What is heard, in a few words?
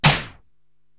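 DJ SD Stop, snare drum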